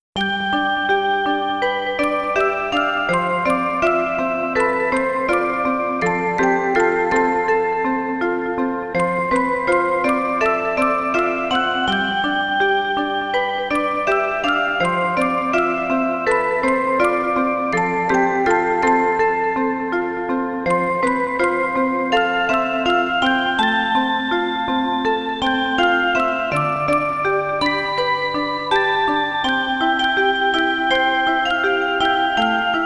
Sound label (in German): Orgel